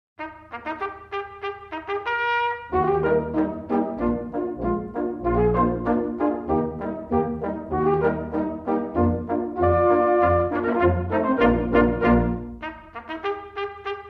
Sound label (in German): Stelzentanz Polka